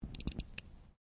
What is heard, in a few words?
Watch Clockwork Wind, Click; Objects